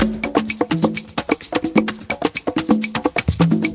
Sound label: Latin House Loop 128 BPM; Vengeance Samples